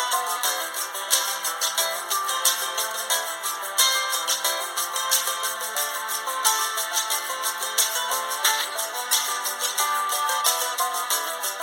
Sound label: karaoke center kill eq